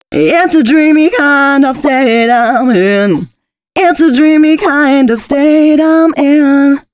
female c# 138, hooklines 136-140 BPM

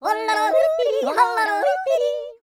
YODEE, World Voices; ILIO; Vocal Planet

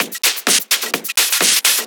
Discohouse Loop 128 BPM

